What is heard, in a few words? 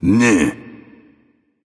tongue; smite; poseidon